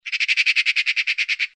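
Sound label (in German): elster rufe, magpie calls; nistkasten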